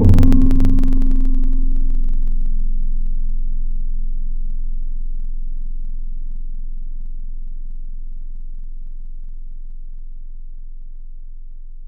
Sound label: Mallet Toy Bell; Instruments; Synthetic's Serum Collection; Echo Sound Works Core Tables